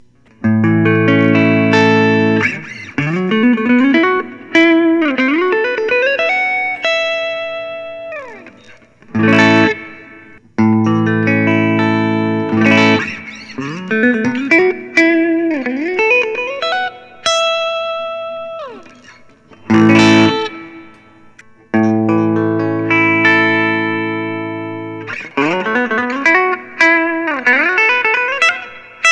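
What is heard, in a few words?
gibson custom aged 59 les paul, guitar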